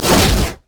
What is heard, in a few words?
mage chains; LoL; ironforge xerath